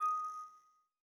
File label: Rose Hover; netent